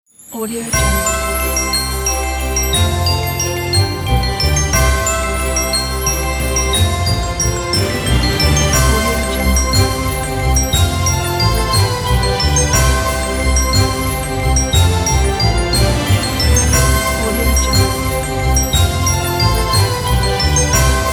christmas logo; inspire

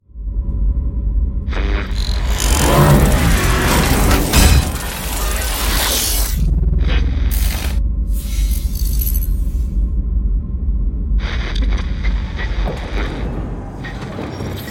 Sci-Fi; BOOM Library

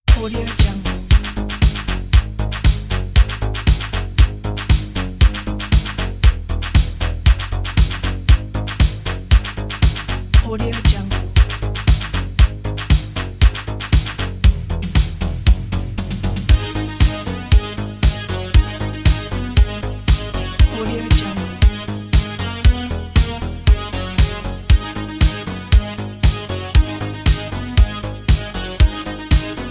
80s disco retrospect

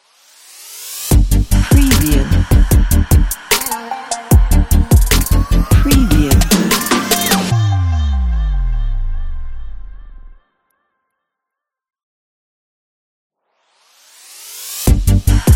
future bass ident

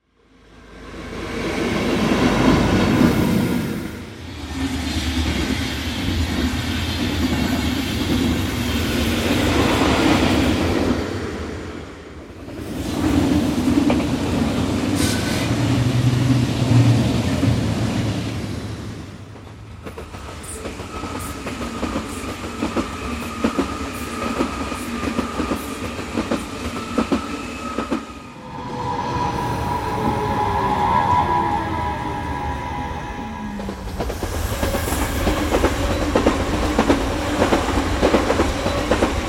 pass by trains trucks and cars

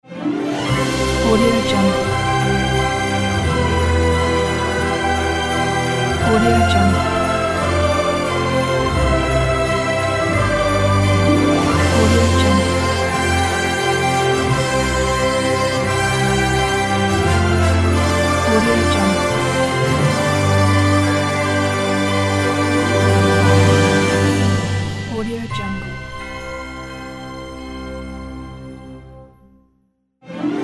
Christmas Magic Ident; AudioJungle

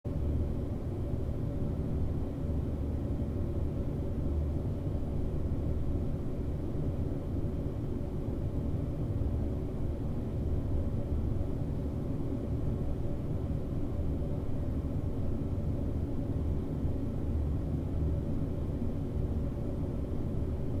roomtones

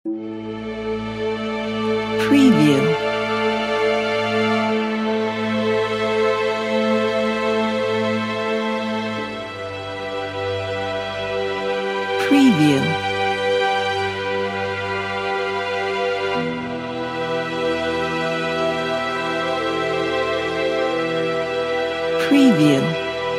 cinematic sad strings score

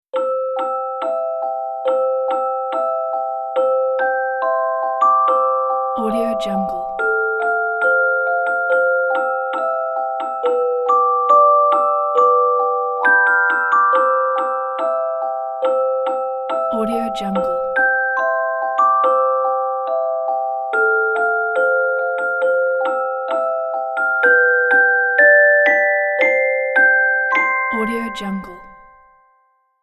a sleepy christmas eve

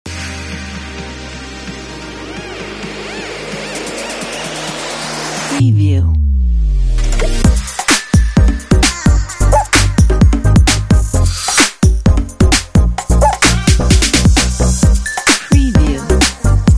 future bass in motion

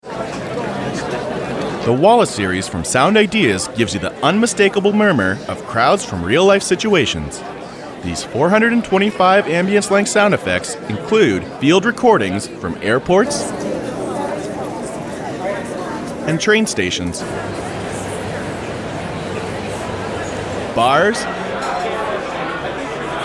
unmistakable murmur of background crowds; Walla Series

